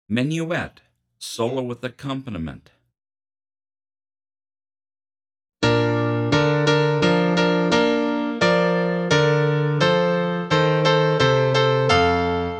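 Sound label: Menuett Bari Full, Bari Sax; 30pct Slower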